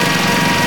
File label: drill loop; Barotrauma; barotrauma ek